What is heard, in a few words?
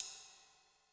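Sizzle Lite, Cymbal; Yamaha DTXpress-II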